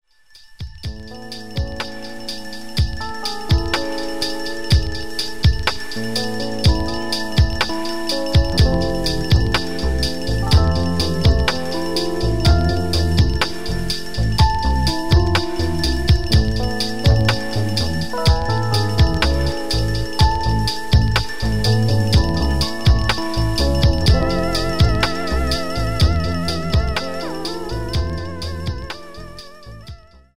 room tone interlude

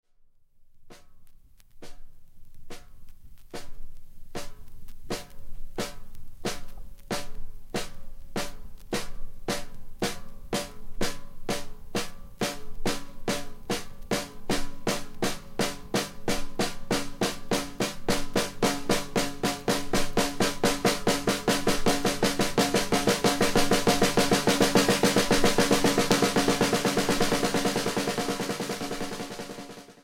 snare liftoff; whiplash

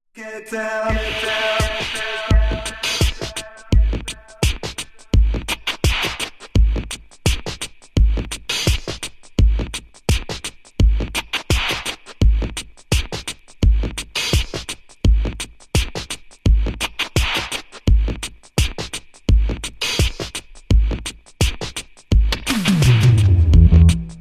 beats bass

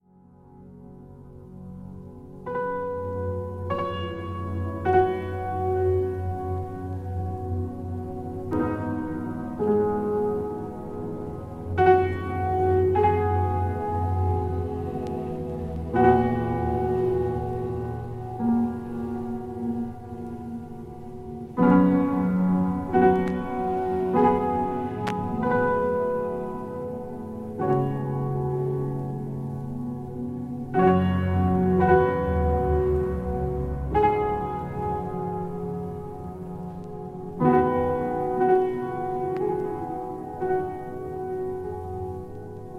distant pianos

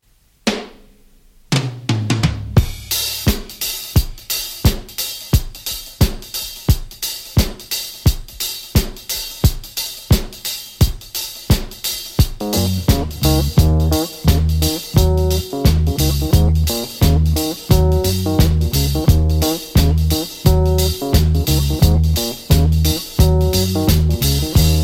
shakuhachi & koto jazz funk

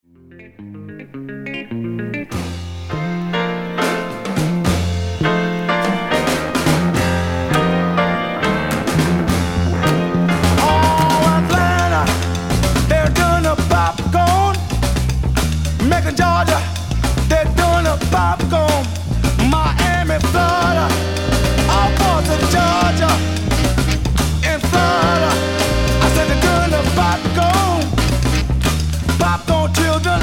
New Orleans funk

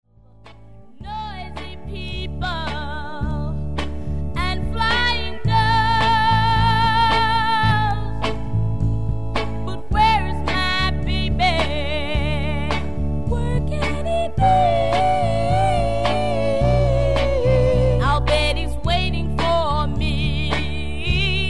crowded park

